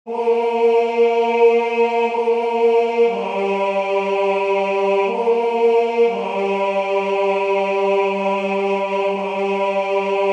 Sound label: Tenor, chorale; Graduale